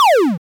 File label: sling